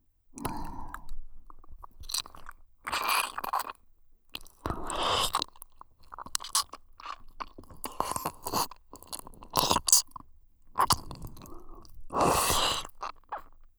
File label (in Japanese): W耳奥ローピストン舐めループトラック, ASMR; 一人で両耳責めしてくるプロ級耳"奥"舐めマスターなお姉さんにお耳の奥の奥までほじほじグポグポされる話